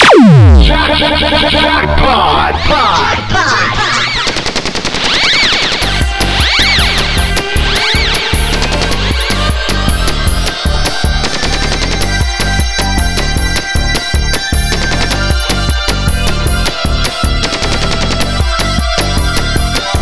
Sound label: Jackpot Jam